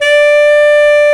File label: Bs Clarinet, Orchestral Winds